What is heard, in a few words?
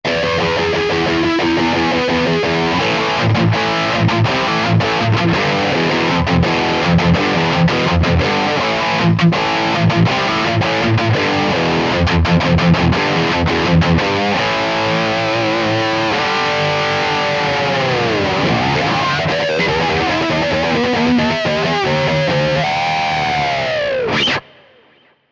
Mesa Boogie Tight Perkussive Riffage; Neural DSP